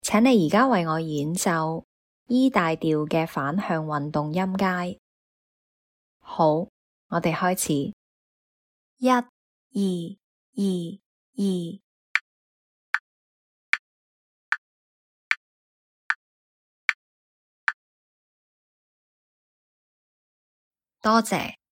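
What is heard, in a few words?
E major contrary motion scale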